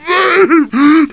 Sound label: zombie voice idle; Half Life 2